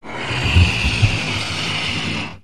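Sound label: Inflating Balloon, Sound of someone inflating balloon at a party or something.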